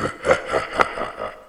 Haha

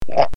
slimy monster or murderd sound

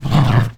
dog grunt; frieda

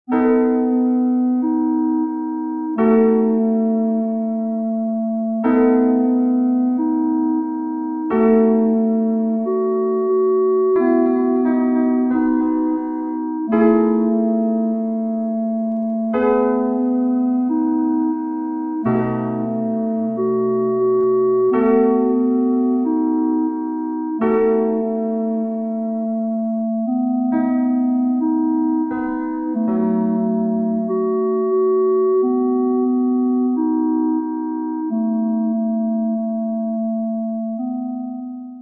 warmth

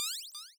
radar

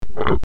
stone rock or wood moved

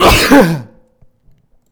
sneeze

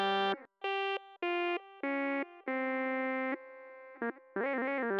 synth riff

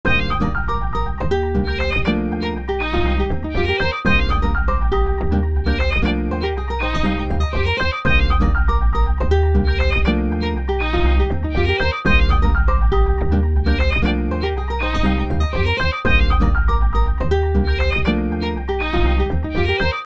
Waiting Music

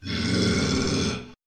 growl

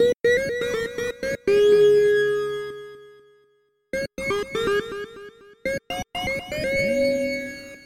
SWINGING TUNE

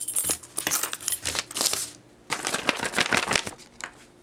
searching in a table

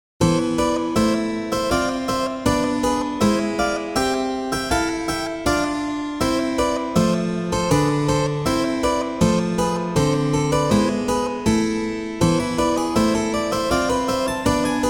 Harpsichord

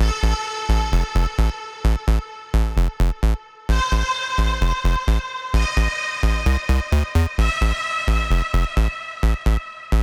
sinister loop

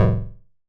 gong

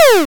laser; Die for the Empire

